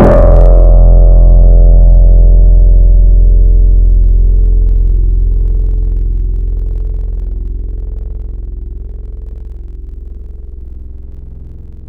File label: Chroma, Tropical Mallets; Synthetic's Serum Collection; SparkPackers